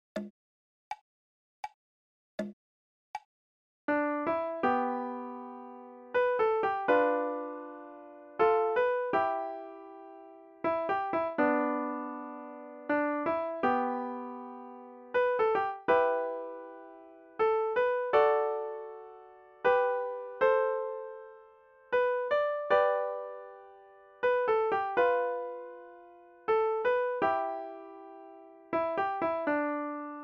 Amazing right

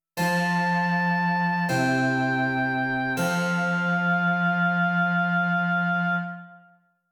continuo, dictation

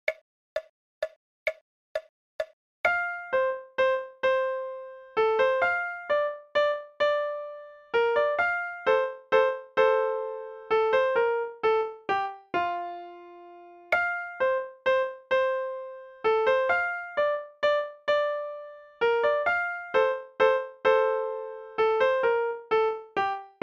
Leopold Minuet RH

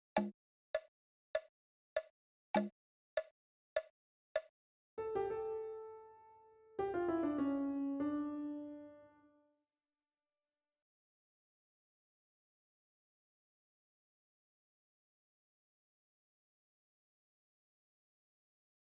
Toccata Fugue right

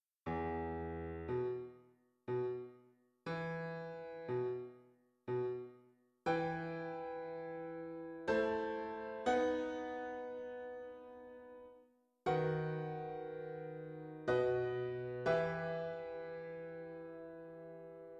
Piano

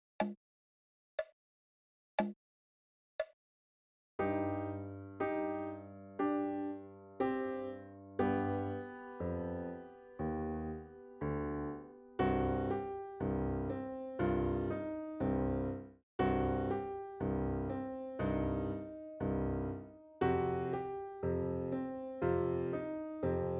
Goblin Blues Slo

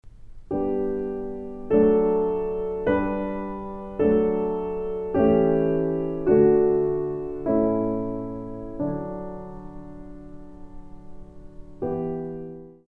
Chorale

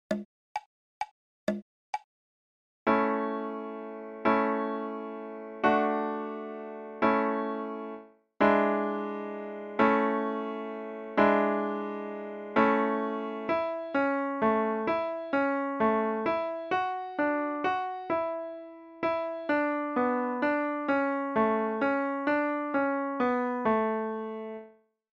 Good Morning In A LH

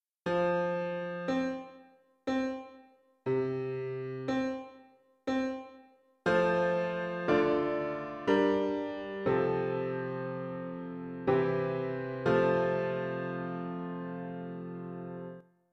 Piano

